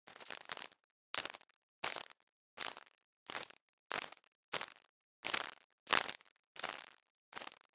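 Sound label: synth dry leaves, Physically-informed sonic models; The NIW Project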